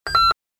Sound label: Gamepad button digital tone down right